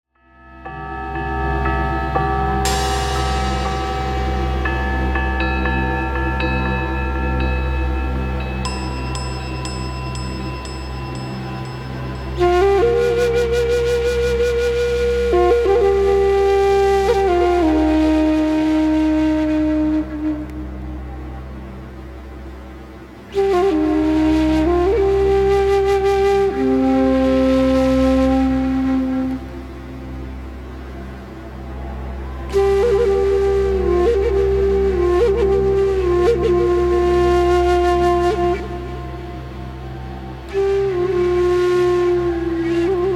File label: Mystical Bells